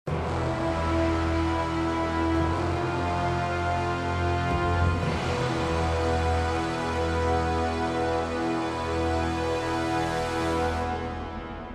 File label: Countdown; Hitman Absolution